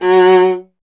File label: viola F; Miroslav Vitous; Solo Instruments VOL-1